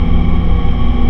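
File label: Engine Drone Interior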